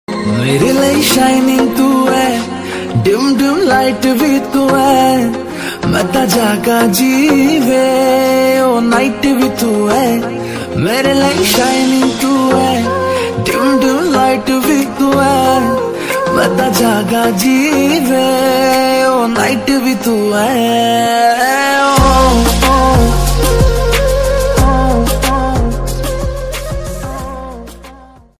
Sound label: Punjabi Song Ringtone